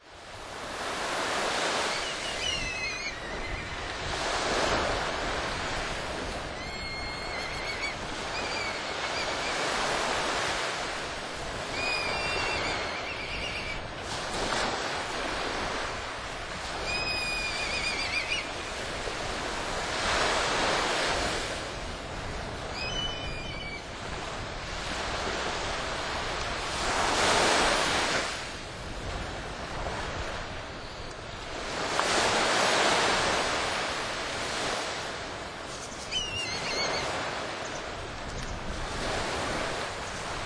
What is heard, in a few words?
kite